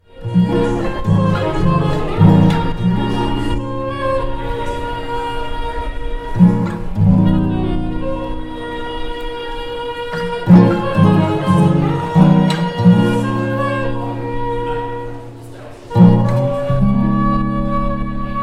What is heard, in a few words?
jazz train bank